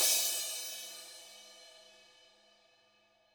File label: CYM; Bob Clearmountain Drums I